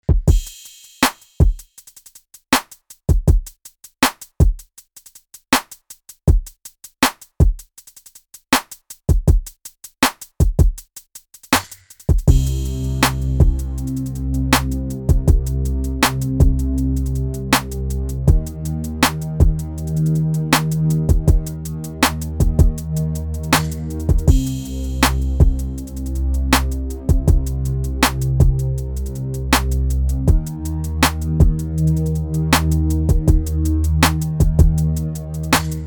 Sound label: Cm 160 BPM Hip Hop